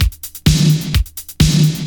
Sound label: Clubhouse Loop 128 BPM